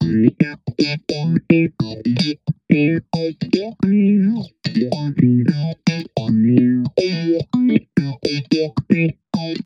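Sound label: guitar wet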